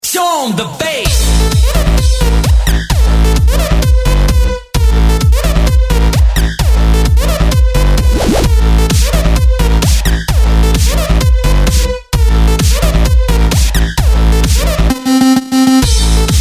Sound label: Sexy Bass